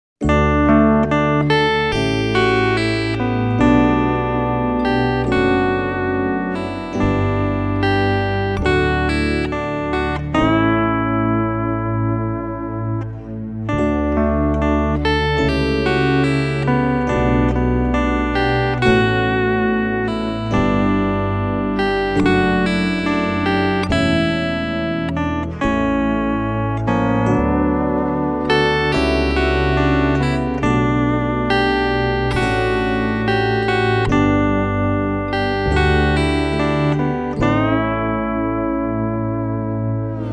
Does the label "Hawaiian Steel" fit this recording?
Yes